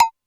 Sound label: Md Synth, Alesis D4 drum machine